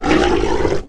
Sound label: short monster whirr; Voices